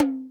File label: Tom A4, Roland U220